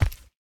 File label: footstep; smite; ganesha